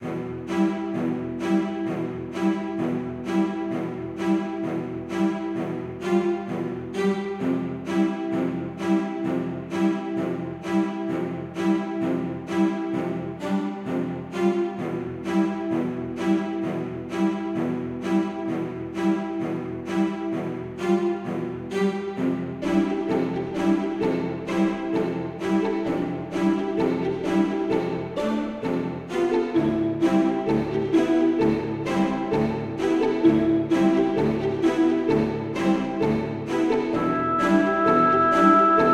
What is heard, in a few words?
forest